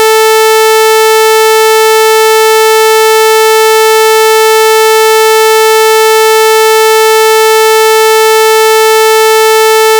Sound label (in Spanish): Diente de sierra 440hz